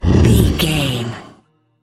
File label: troll monster effort growl small